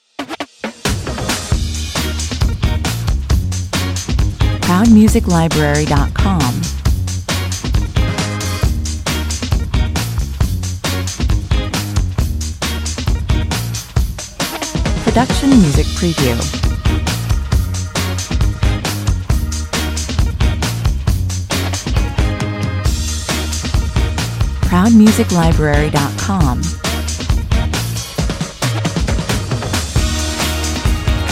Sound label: no horn or guitar melodies